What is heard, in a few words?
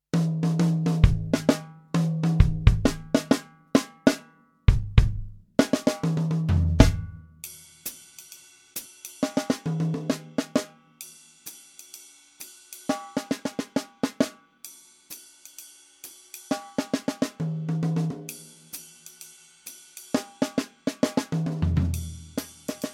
Groove